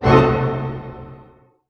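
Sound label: D# diminished pianissimo, orchestra hits; Sound On Sound - Peter Siedleczek's Orchestra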